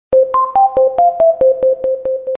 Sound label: 3d future ringtone; ringtones